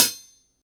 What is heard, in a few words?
hihat, DrumMachine